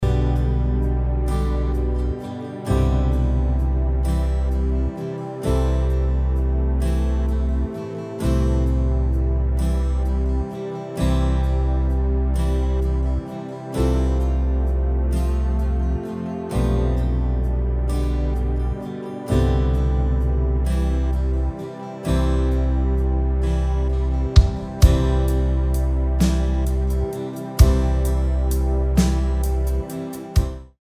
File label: Karaoke Song